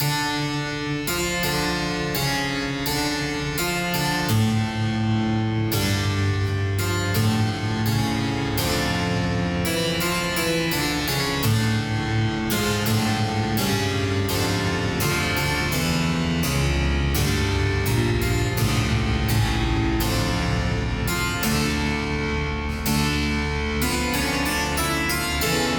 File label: Theme Tests Harpsichord; Sea Salt OST (2020); Theme Tests - 1 Harpsichord v2